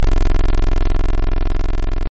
Vibrating sfxr

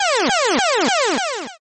Boss dest 1 chiptone

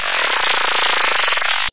Long laser

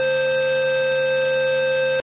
Piston move chiptone

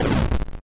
Timpani chiptone

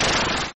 Boss stop chiptone

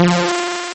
Peow saw sound

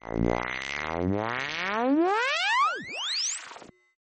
Gradual squeak chiptone

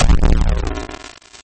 Sizzling boom

